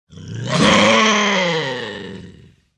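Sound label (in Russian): zvuk rysi, lynx sound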